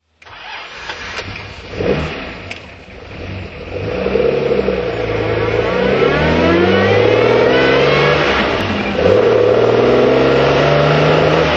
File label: austin healey 3000 drive; deniswelch